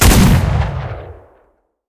cannon 37mm flak36 shot; cannons; War Thunder

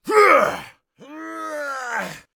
death; Escape from Tarkov

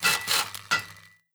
metal construction; Rust

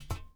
pickup metal bars; Rust

